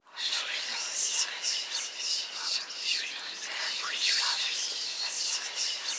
WHISPER; WHISPERS

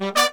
100, BRASS SAMBA

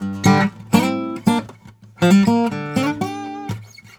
Blues Guitar Samples; ILIO; Fretworks; LICK

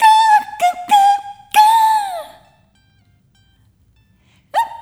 Rhythmic A

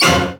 ORCH HIT, Drum Hits

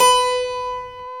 HARPSICH

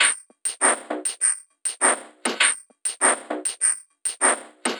perc 100, Percussive Loops, 100 bpm

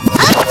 FX REVERSE

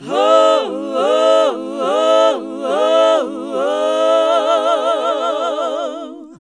HAL GOSP. MIXED; Sound & Vision - Gigapack II CD 1 (Roland); HAL Gosp.Mixed 2

